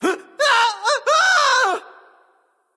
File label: fear; smite; ahmuzencab